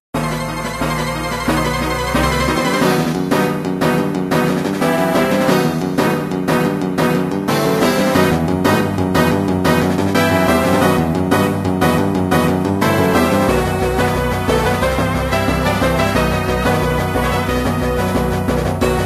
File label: bgm